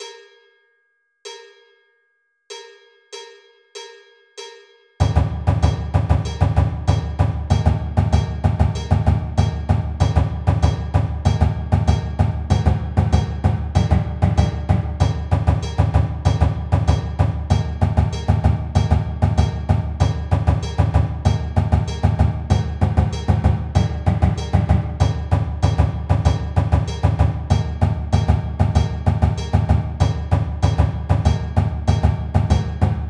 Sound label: basses 96bpm